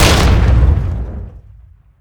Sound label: Hit Med, Damage; DCS